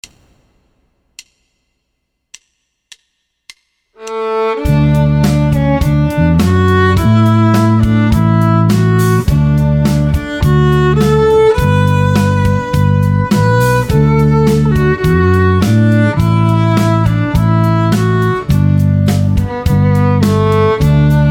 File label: Playalong Auld Lang Syne